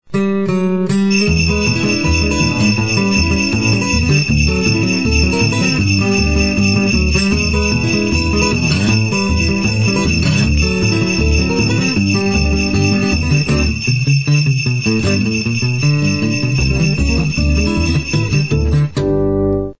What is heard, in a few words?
field theme christmas